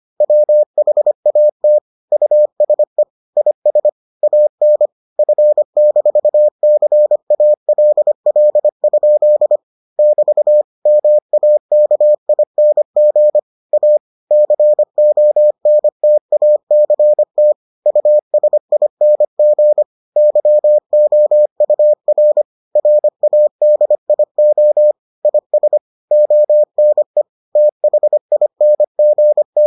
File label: morse